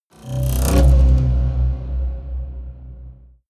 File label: haunted strings roll deep